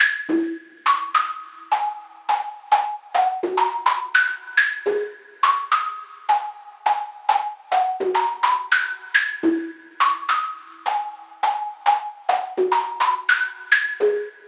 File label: synth loop 105 G min; CAPTAIN CUTS